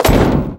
sheetmetal run

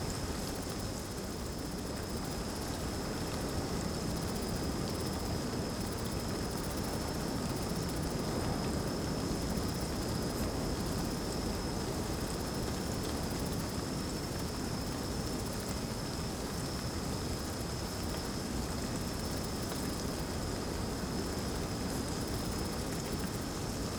ambience desert forest night breeze drizzle; Rust

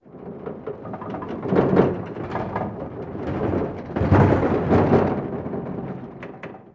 ac130 rattles

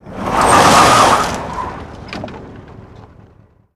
humvee skid dirt; trainer; Call of Duty Modern Warfare

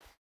footstep burlap cloth jump start; Rust

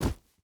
footstep hide gravel jump land; Rust

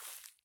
walk grass low; Escape from Tarkov

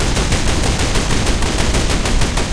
loop mk103 38

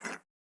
ui pickup spring; Rust

